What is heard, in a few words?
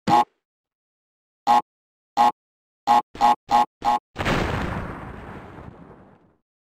tnt crate; Crash Bandicoot Gameboy Advance